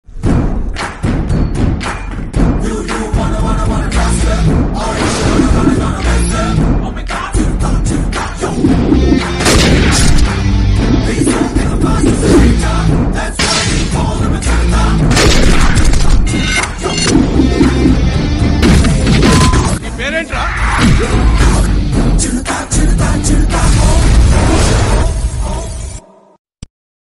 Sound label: bgm music; chirutha; ram charan; neha sharma; telugu